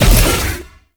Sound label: Bowl Jump; Warframe; Grendel; Grendel Deluxe